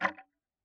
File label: Tick, Clocks Kitchen Clock